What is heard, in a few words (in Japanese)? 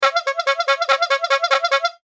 パフパフパフパフパフ